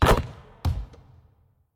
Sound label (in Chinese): 投中; 战中; nba